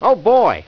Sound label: fall